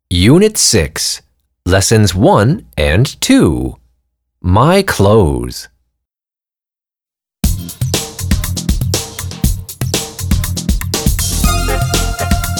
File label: Song My Clothes; Student Book 3